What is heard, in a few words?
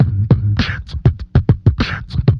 quicksilver, Beatbox, Drum loops